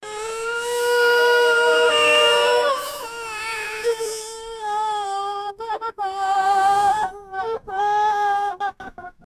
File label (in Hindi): chilla kar rone ki aavaj, crying